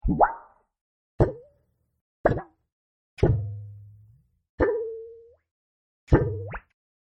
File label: Low Pitched Cartoony Effect-6 Takes; Cartoony Effect Mono Low 02; Cartoon Sound Design Sound Effects